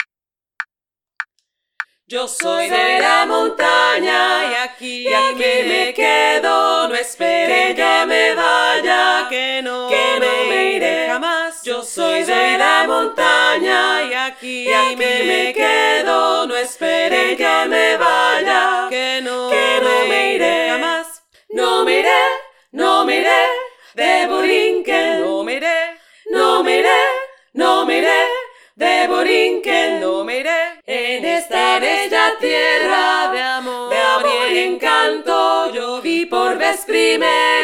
3 VOIX

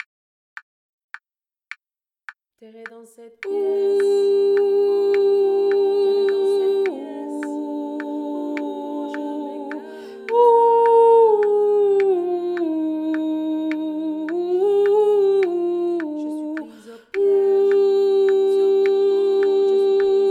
LE LOUP VOIX

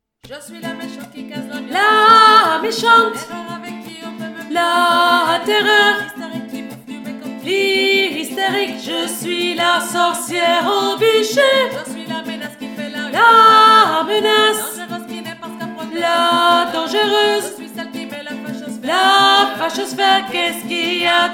VOIX 3

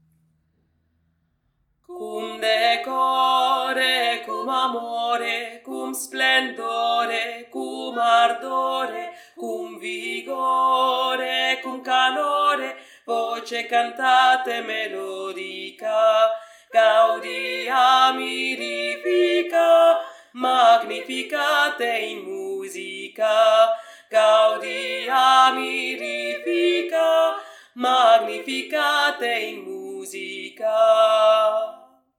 VOIX GRAVE; CUM DECORE

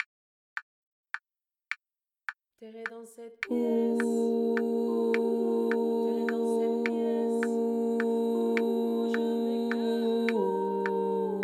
LE LOUP VOIX

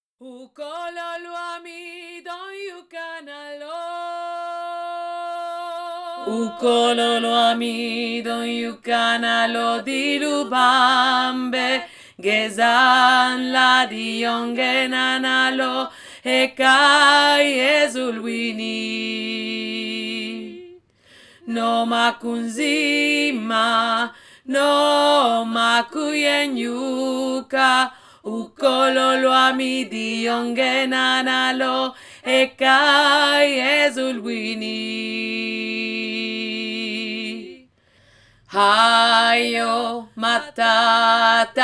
VOIX GRAVE

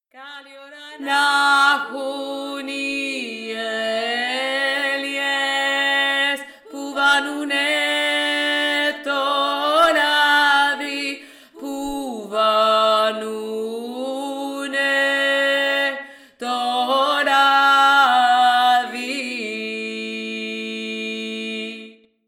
VOIX 3

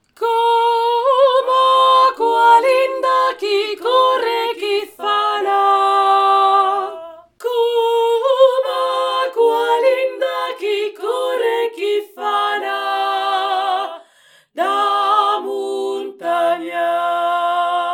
VOIX AIGUE; ACQUALINDA

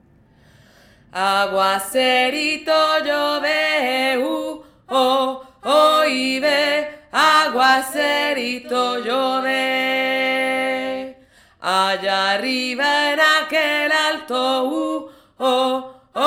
VOIX 1

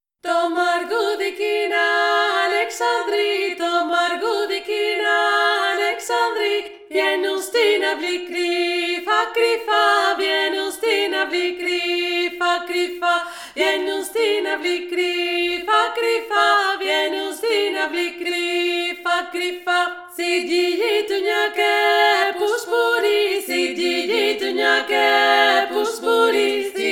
TOUTES LES VOIX; TO MARGOUDI

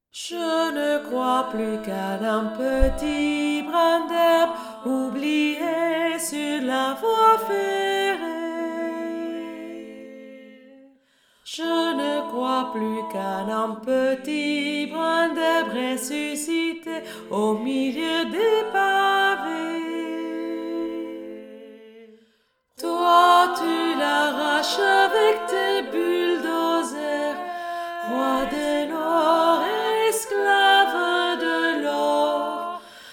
voix principale

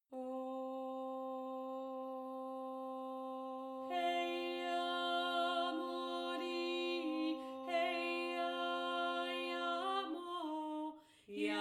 3 VOIX; HEYAMOLI VOIX 2

